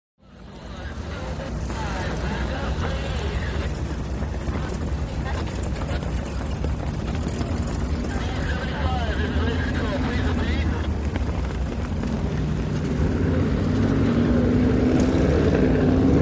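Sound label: Power Boat Race Ambience, off shore power boat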